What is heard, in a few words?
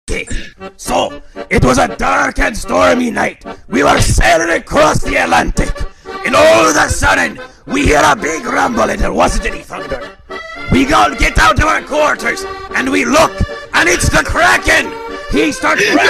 chant